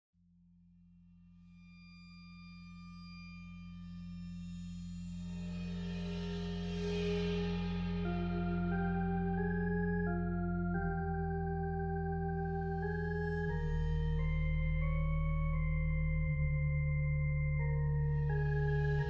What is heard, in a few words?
Organ